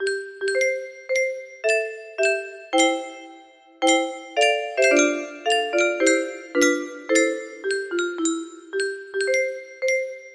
133 notes g major, music box melody

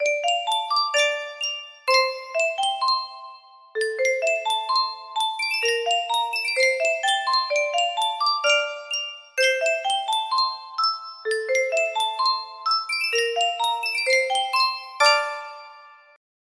Yunsheng music box, music box melody

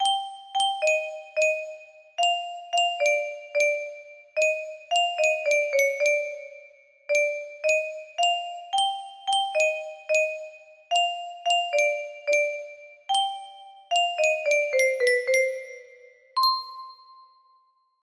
lullaby, music box melody

